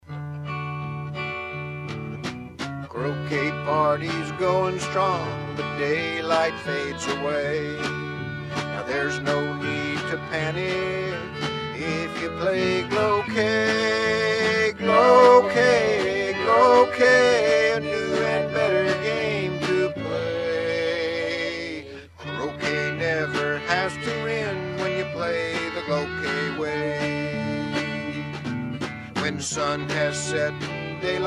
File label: Folky Jingle